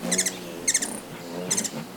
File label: ruby throated hummingbird